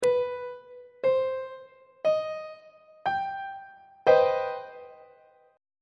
b sharp minor major 7th 3rd inversion on treble clef